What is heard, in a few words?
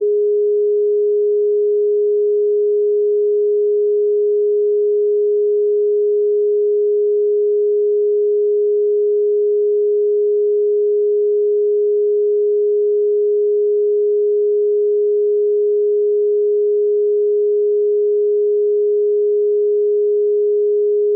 415Hz; Musical notes and their frequencies.